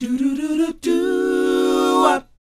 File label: DOWOP G#, Vocal R&B DOO WOP; ILIO; Vocal Planet